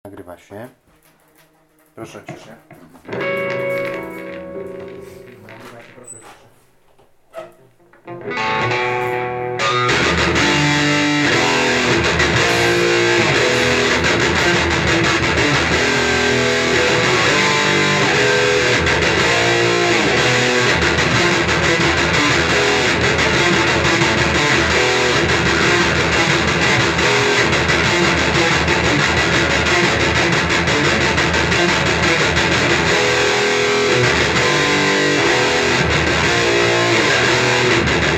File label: palm muting